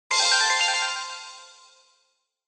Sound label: Bingo Machine Digital Result Speaker